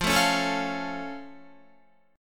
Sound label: F Augmented Guitar; strummed; 12,12,13,14,13,13; down; 4F,4A,5C,5G,5C,6E